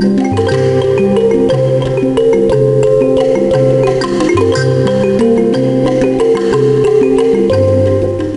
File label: Loop